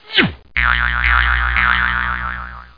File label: Bush jump; T.J. Finds a Friend